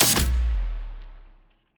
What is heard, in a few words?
gambling close; BARs 7s; wazdan